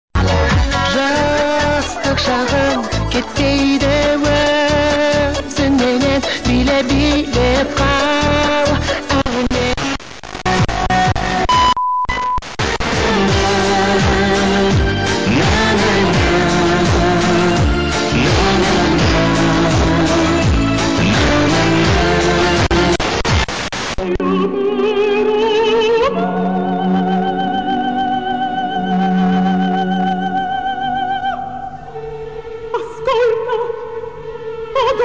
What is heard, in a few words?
85 110 MHz